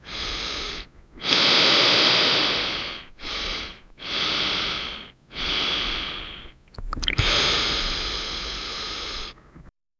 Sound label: breathe air into the cute, bubble